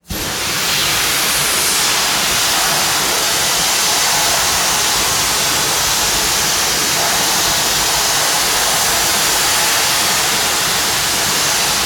Steel Cutting, Machine, Industrial, Factory; Jake Fielding; Symphony of Steel - Steelwork Ambiences

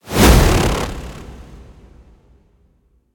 Warlock, Trailer Whooshes; Federico Soler Fernández; Effective Trailer Whooshes

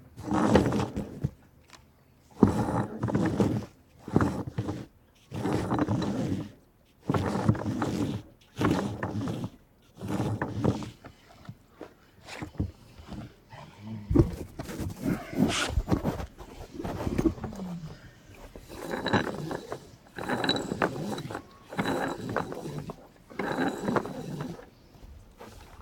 EXT Small yacht, rope moving through metal; BOATMech; Rogue Waves; Yacht & Marina

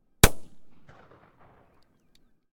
Shotgun Fire; Wav Junction Sound Effects; Shotguns

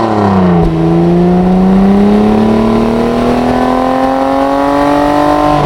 atv second gear, vehicles; hl2

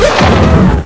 houndeye blast; hl2

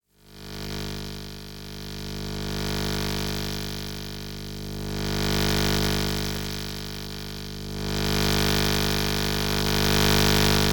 Electrical Panel Pitchdown 12st; High Voltage; Rescopic Sound

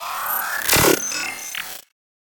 Weapon Reload, SCI-FI WEAPONS; MatiasMacSD; THE WEAPONS

